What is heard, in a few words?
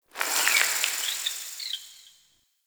Interior Cave Critter; Ambience; Warframe; Duviri